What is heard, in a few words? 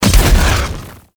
Bow Arrow Rain Explosion; Ultimate Abilities; Madurai; Warframe; Focus